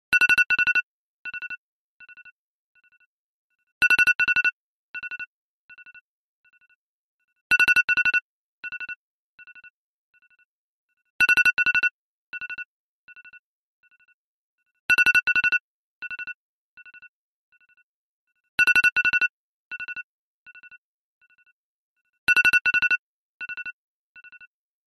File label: Alarm